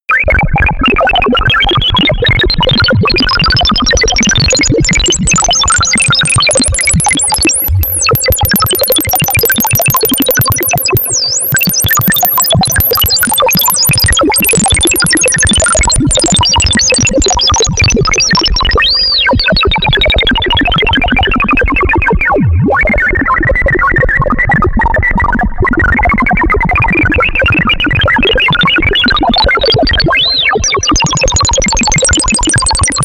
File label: atmosphere beeping glitches